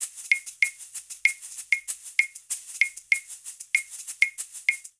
Son Shaker Claves 192bpm, Latin Percussion; Ableton; Factory Packs

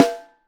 Top, Snare; Ableton Foundation FreePacks; Snare Designer

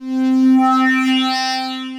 Null Coast Pad, Synth Pad

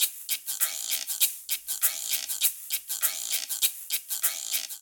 Bomba Shaker & Guiro-199bpm; Latin Percussion; Ableton; Factory Packs

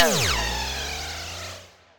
Radio Riser; Effects; Ableton; Glitch and Wash

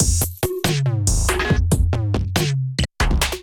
Preon Kit groove 140 bpm; MIDI Clips; 133 - 151 bpm; Ableton; Glitch and Wash

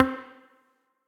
Cylinder Pipe Drum; Instruments; Fleamarket Percussion; Ableton; Factory Packs; Lost and Found

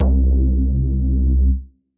Clean Reese, Bass; Ableton; Skitter and Step

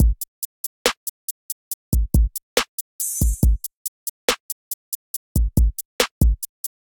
Kit, Trap Drums; Drift Kit; Ableton; Factory Packs; Trap Drums by Sound Oracle

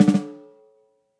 SNARE stick press roll

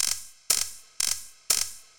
IDM Perc, IDM Percussion

